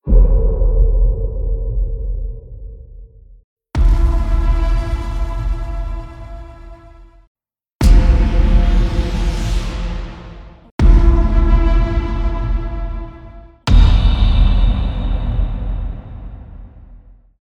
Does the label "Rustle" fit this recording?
No